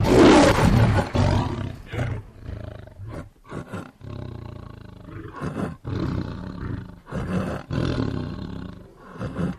Lion Tiger Roar; big cats

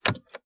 dash switch; switches & levers

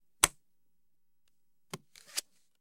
Card Flips Grabs Card

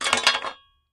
Shovel Drop Metal On

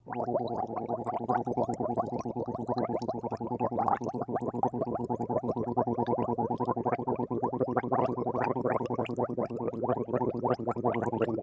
Gargling

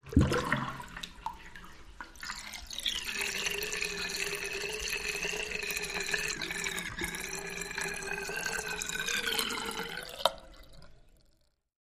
Wash Basin Trap

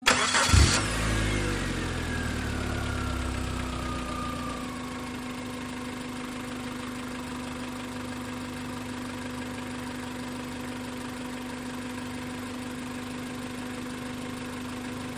Sm Car Eng Idle Off, compact

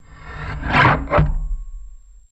Lever of Paper Slicer Pulling Quick; papercutter

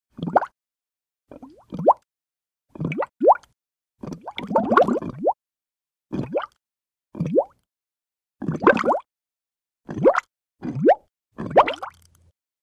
Bubbles; Gurgling Up Of Big Slow Bubbles.

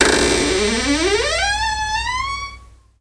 Door Creak Open, Noisy; hinges & creaks

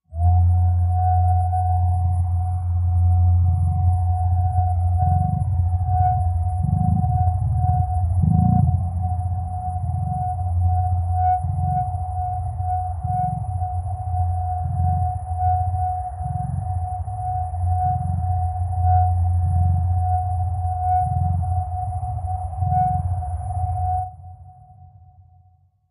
Machine, Whistle, Low Drone, Hum; Patience Trainer

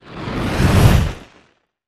Fire Big Flare By, torches

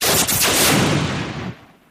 Hand Phaser Single Shot

